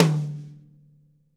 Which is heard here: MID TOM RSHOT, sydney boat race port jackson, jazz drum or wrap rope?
MID TOM RSHOT